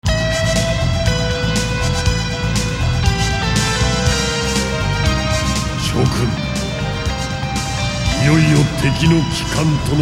Cinema Music & VO; macross 2036; turbografx 16

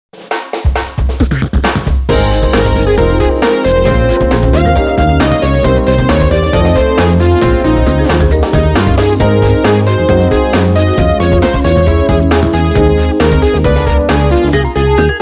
Music Success event; Jikkyou Powerful Pro Yakyuu 2014; PS Vita

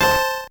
core squish

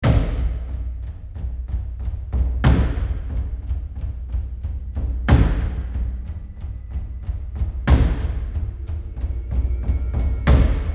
Tension High Chunk; the last of us

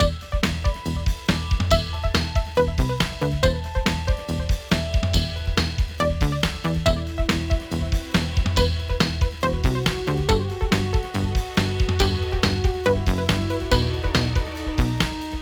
MUSIC MAIN; Lootlite; Xbox Series X/S

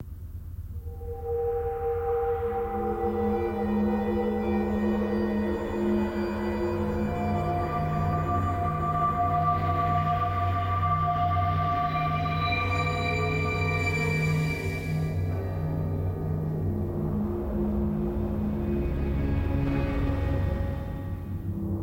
Ghosts deck internoise

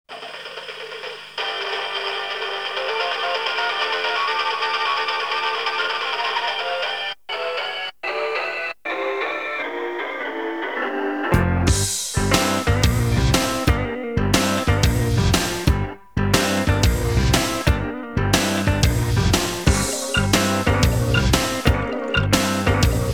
Dungeon; Falcom Perfect Sound Catalog II

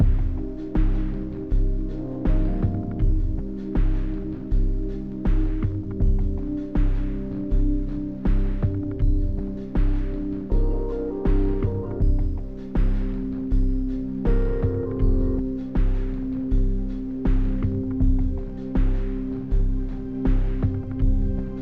Exploration Night 8CH 80BPM; Downtown Act1

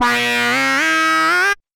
Beat

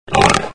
Boss Damage; Angry Duck Cannon 1.5

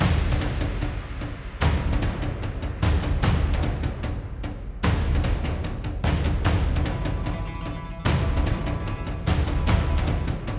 Combat Perc loop; Badlands

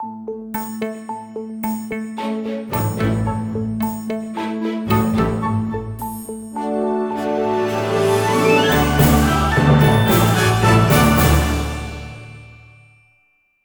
Fireworks Music

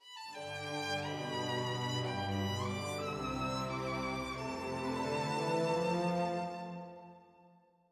Stinger

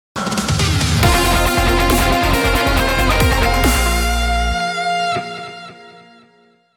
Power Activation; Advance Wars 1+2 Re-Boot Camp

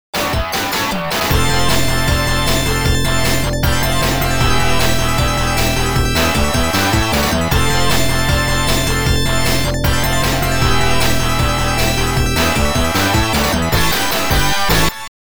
Dramatic Ending; Oretachi Game Center Zoku: Sonic Wings

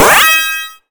Se Goal

